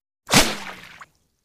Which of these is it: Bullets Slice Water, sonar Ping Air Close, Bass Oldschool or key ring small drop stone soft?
Bullets Slice Water